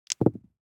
DICE on Felt, Throw and Roll, Standard, 2 Two Dice; Samples; ArticulatedSounds; DICE Sfx Party (Extended)